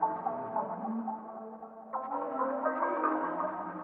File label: ethno, 125, A